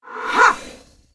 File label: summoner attack; propack